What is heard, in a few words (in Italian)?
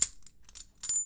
Catene; BLIND QUEST - The Enchanted Castle